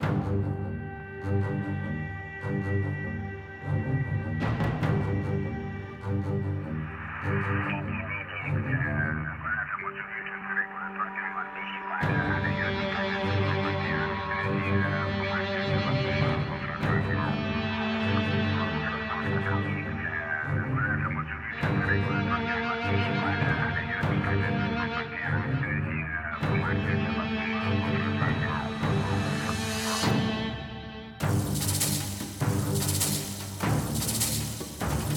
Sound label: Action 140bpm Low; project zomboid